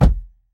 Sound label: Giant step